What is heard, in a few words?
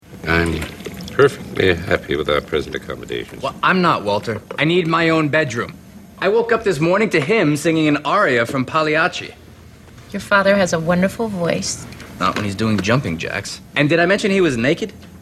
singing aria naked